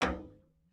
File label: Fidget Arm Bonk; emotes; Overbuild